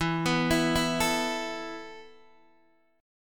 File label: E Suspended 4th, Guitar DADGAD; x,7,9,9,7,7; down